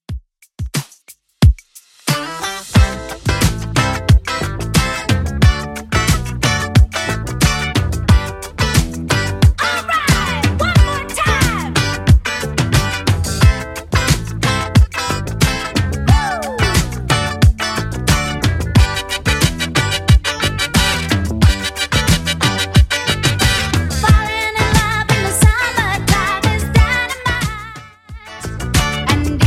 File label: 70s Redrum 90bpm CLEAN